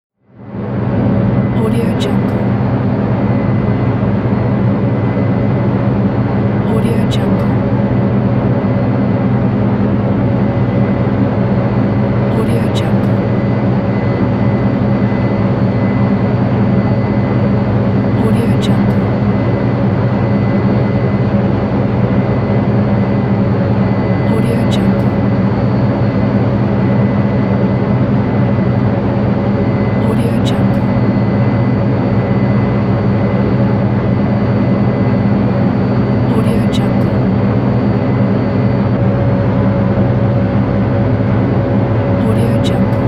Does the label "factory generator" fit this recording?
Yes